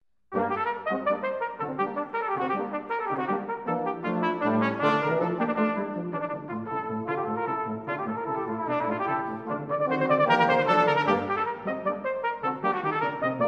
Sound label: Concert Etude, brass quartet